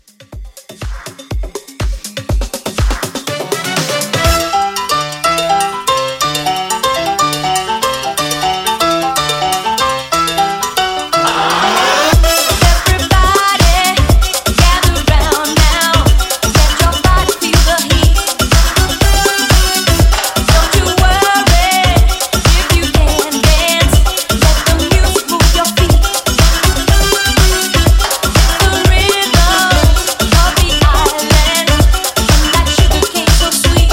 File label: 90s Pop Re Drum 122